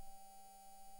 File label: mic, mars2020 edlcam